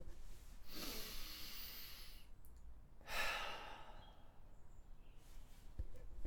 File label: Breath